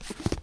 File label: pick up book